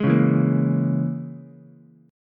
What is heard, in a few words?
A sharp Minor 7th Flat 5th A sharp, Ukulele; strummed